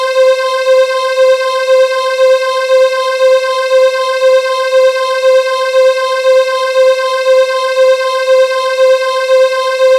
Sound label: STRNG, Yamaha DTXpress